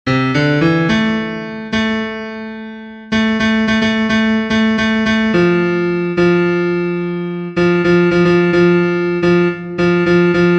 TENOR, himnos en piano; cantos del camino

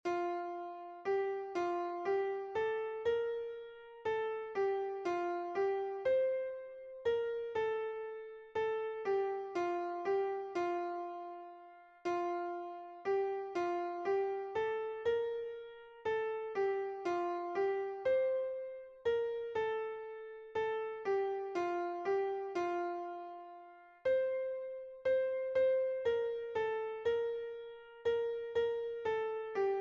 soprano, himnos en piano; 89 ALELUYA GLORIA A CRISTO; cantos del camino

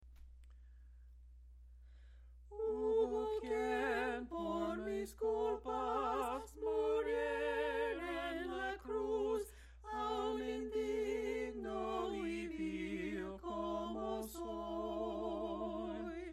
HUBO QUIEN POR MIS CULPAS, himnos a capella; cantos del camino

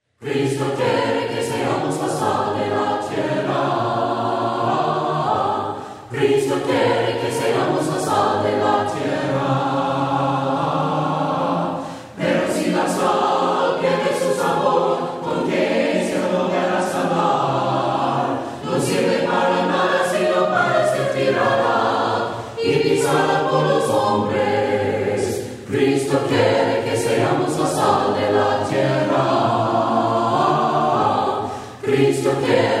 SAL DE LA TIERRA Y LUZ DEL MUNDO VOCES, himnos a capella; cantos del camino

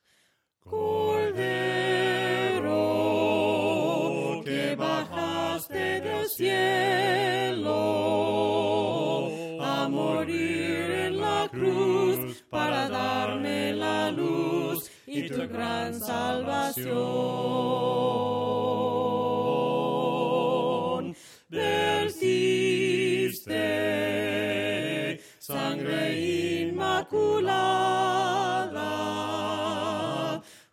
CORDERO VOCES, himnos a capella; cantos del camino

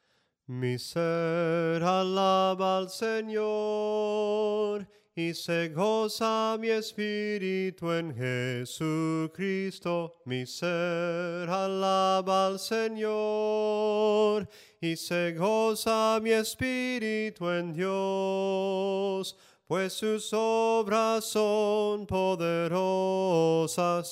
SOPRANO CANTADO, himnos en melodia soprano

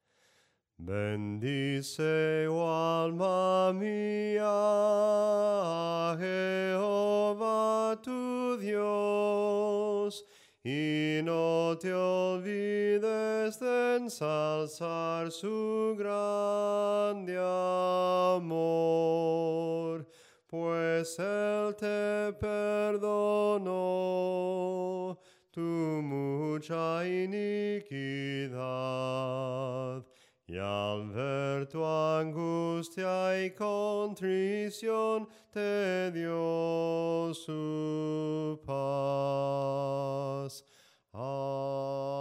SOPRANO CANTADO, himnos en melodia soprano

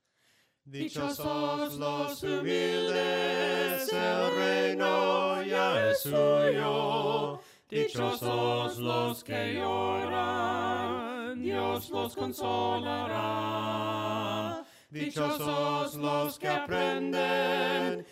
VOCES, himnos a capella; cantos del camino; DICHOSOS

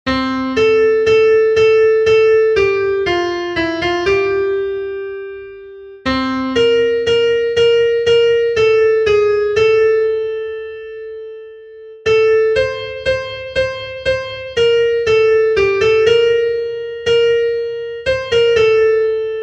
SOPRANO, himnos en piano; cantos del camino

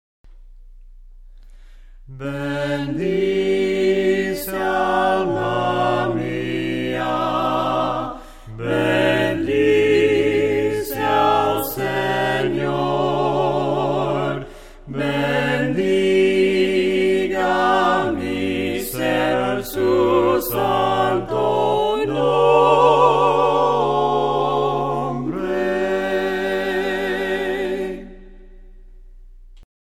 VOCES, himnos a capella; cantos del camino; BENDICE ALMA MIA

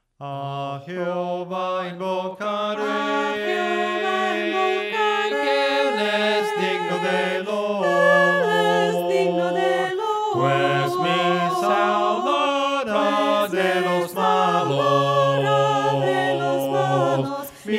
A JEHOVA INVOCARE VOCES, himnos a capella; cantos del camino

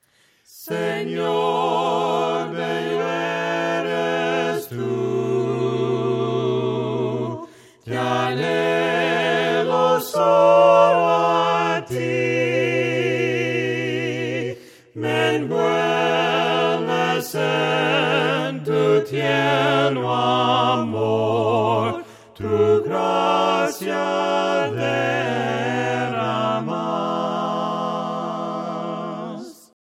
VOCES, himnos a capella; cantos del camino; SENOR BELLO ERES TU

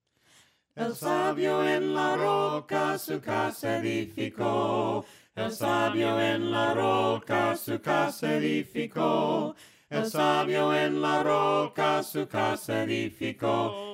EL SABIO EN LA ROCA VOCES, himnos a capella; cantos del camino

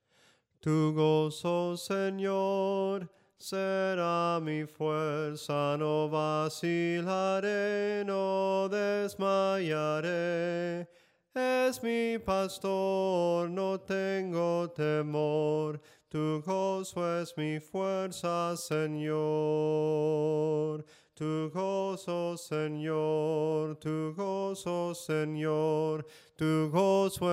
soprano cantado; TU GOZO SENOR

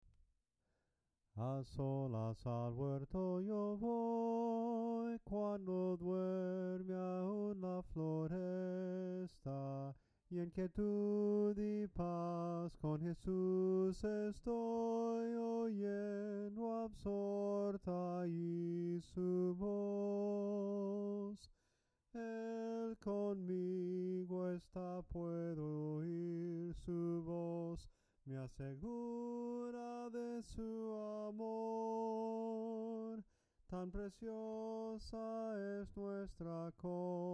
SOPRANO CANTADO, himnos en melodia soprano

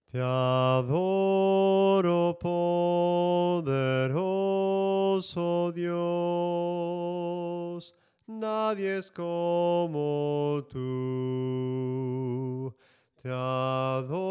SOPRANO CANTADO, himnos

